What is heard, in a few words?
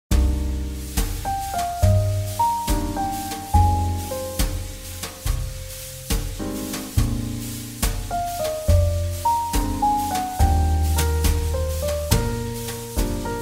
JazzBallad; 잔잔